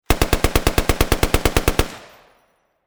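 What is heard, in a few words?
muffled shots from machine gun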